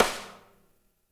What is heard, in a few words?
SNR, GRUNGE KIT; Spectrasonics; Burning Grooves CD2